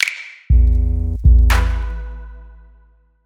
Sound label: Beach beat